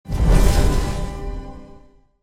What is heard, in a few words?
Leveling Up